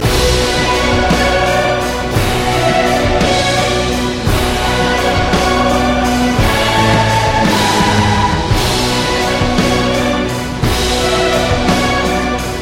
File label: Oriental Effect